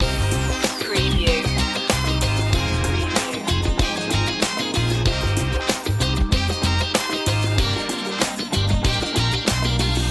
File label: forever funk loop